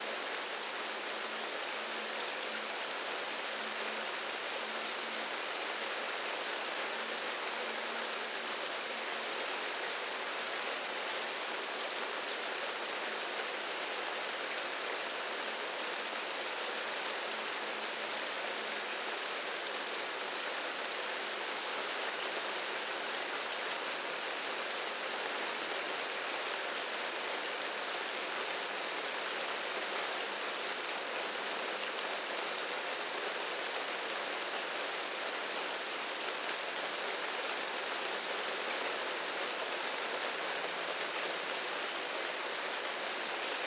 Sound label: RAIN